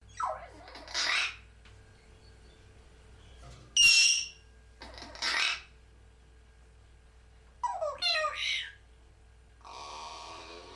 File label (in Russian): govoryashij popugaj